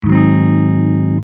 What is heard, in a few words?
Notes Gs7 Down; gamerip; Hylics